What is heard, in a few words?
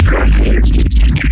Growl Bass; neuro; BIG5AUDIO